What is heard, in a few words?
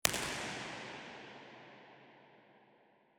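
sports centre cardioid, impulse response; university york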